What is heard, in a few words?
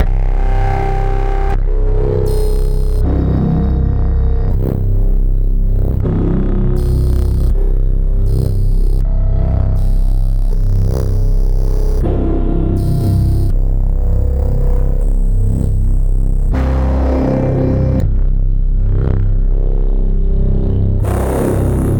Unnerving